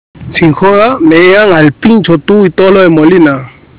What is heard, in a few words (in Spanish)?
lloron; cstrike